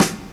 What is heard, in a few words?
SD, Dance Mega Drums; Best Service; SD-HIP